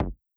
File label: kick slightly better